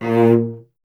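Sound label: strings cello A#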